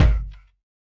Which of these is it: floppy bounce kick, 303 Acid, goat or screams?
floppy bounce kick